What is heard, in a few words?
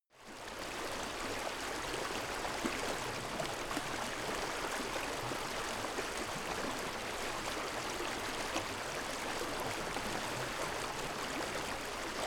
stream bubble dense